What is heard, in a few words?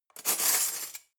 cutlery knives drawer grab